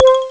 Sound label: Ice Rain, Amiga Soundtracker; ST-XX Amiga Soundtracker Sample Packs